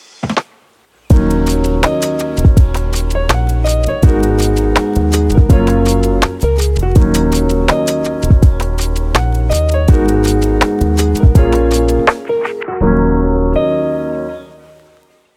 background lofi for lifestyle

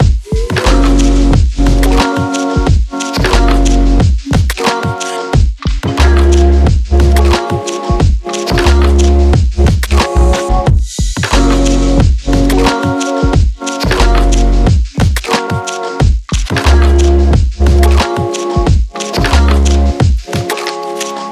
calm urban hip hop loop

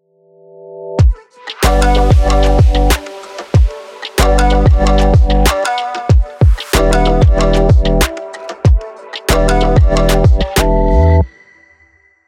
summer jam

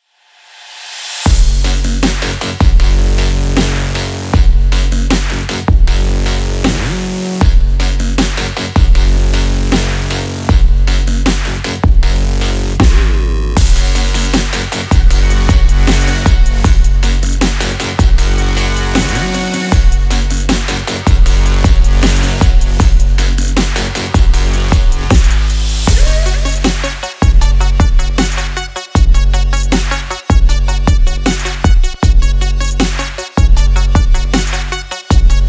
sport action music

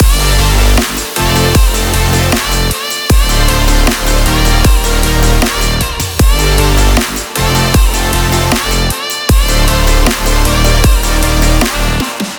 future bass

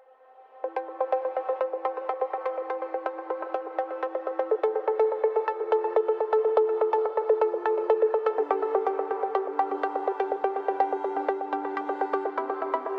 hi tech inspiration

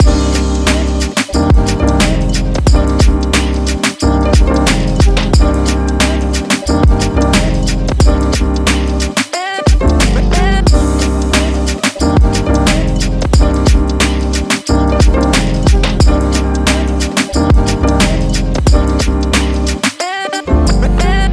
groovy hip hop loop

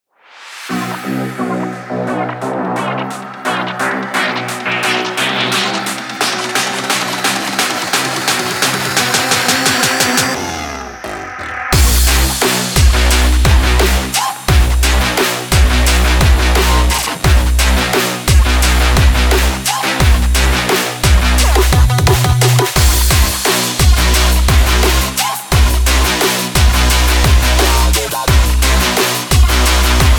energetic dance trap

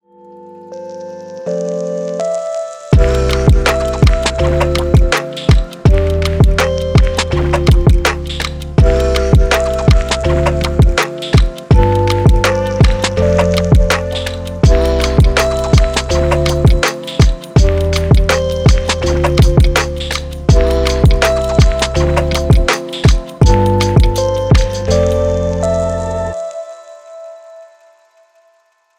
lofi meditation

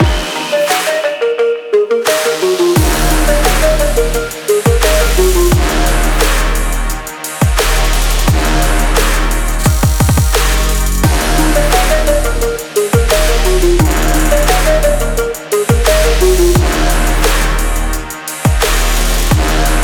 extreme sport trap loop

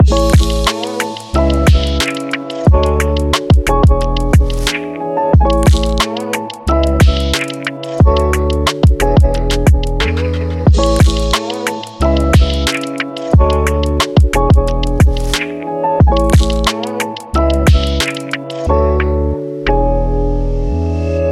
background travel hip hop

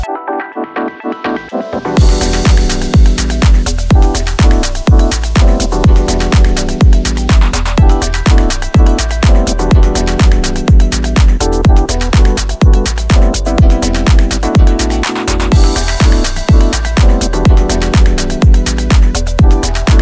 lounge house

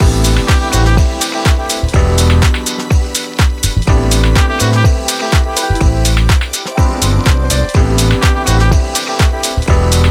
fresh lounge vibes

